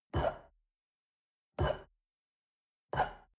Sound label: shell upside down grab from table hard; soundpool